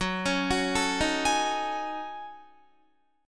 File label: Gb Minor 6th Add 9th Gb down Guitar; Gbm6add9; arpeggiated